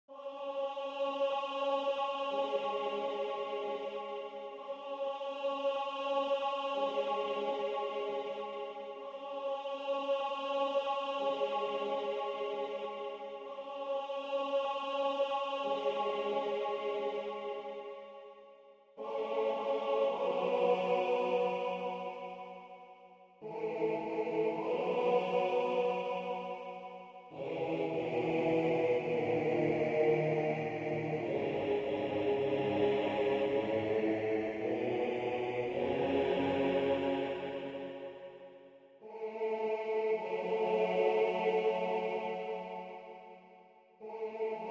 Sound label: bass, choir; babathandaza